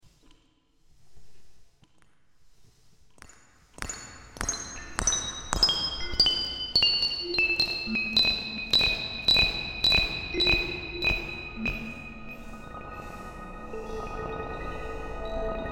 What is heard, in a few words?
Grainman Samples